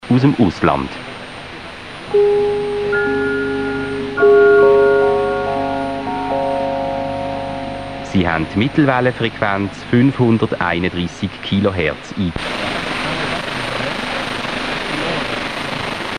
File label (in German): Schluss Abschaltung; Beromuenster